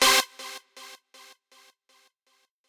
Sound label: lead